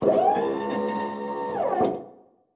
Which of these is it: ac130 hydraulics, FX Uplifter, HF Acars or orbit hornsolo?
ac130 hydraulics